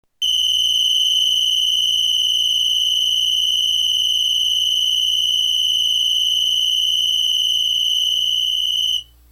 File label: whistle, megaphone; pe-m306w